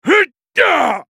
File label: spell cast effort; Garen; Character Voices - English